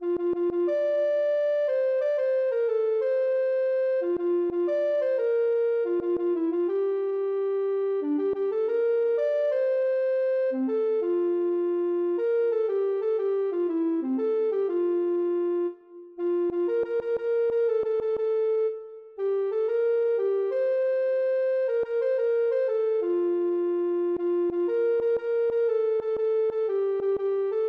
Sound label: ocarina